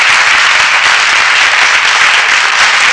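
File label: applauding; Sound Library 2000; Wayzata Technology; People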